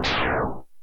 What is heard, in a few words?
Explosion